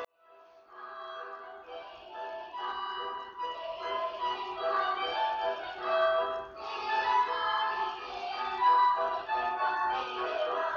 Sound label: Clarinet (recital, live)